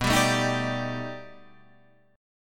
B 7th Sharp 5th Sharp 9th, guitar; strummed

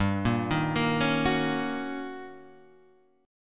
D sharp 7th Sharp 9th

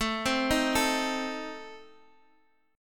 A Diminished A Guitar Half Step Down, chord

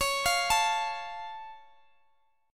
Db Augmented Db down Guitar DADGAD; arpeggiated

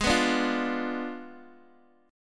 G sharp Diminished 7th, Guitar, Open D

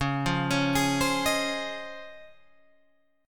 C sharp Major 9th, Guitar, Open G

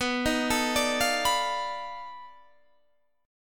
B 7th Sharp 9th Flat 5th, down

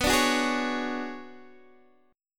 B Minor 6th Add 9th B, Guitar Open D

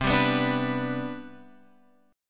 C Major 7th Suspended 2nd C, chord; CM7sus2 Chord {8 10 9 7 X 7} strummed

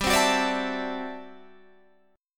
D 9th G down Guitar Standard; strummed; JGuitar

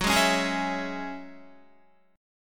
Gb 7th Suspended 2nd, Guitar, Gb7sus2 chord; strummed; JGuitar

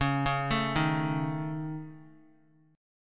C sharp Suspended 2nd Sharp 5th, Guitar; arpeggiated; x,4,1,2,3,x; 4F,4A,5C,5G,5C,6E

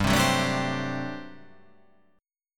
G Major 7th Suspended 2nd Suspended 4th G down

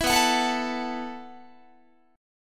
B 7th Suspended 4th B down Guitar; B7sus4; strummed

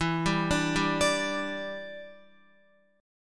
E Minor 7th, down, Guitar; arpeggiated

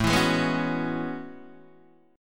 A Major 13th, Guitar DADGAD

